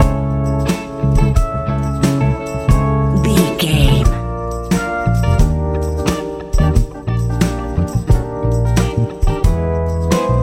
bright warm rice grains love funk

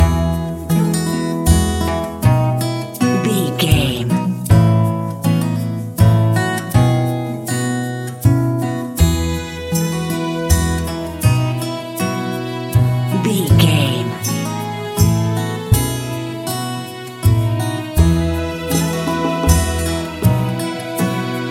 peaceful shire loop

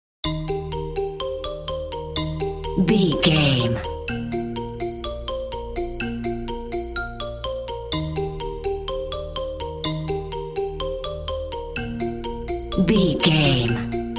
relaxing dreamy mallets and piano

